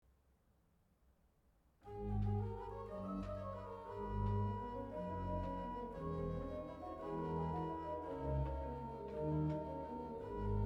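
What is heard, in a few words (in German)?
Sei gegruesset, Orgue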